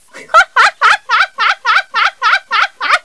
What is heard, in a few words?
haha